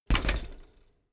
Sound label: bicycle bike land rattle back